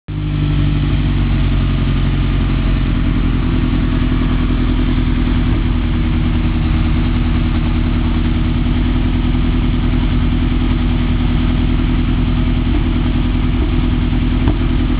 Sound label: Ferrari 308 GTB Sound